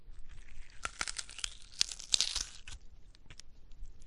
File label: ripping head off